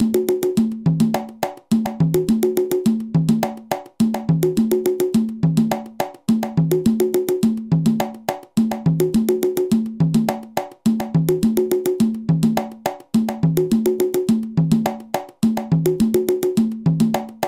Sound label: merensongo conga